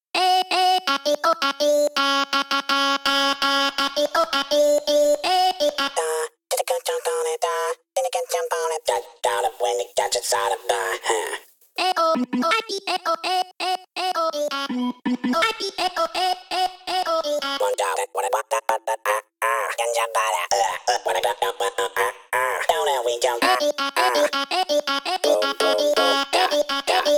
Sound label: Voices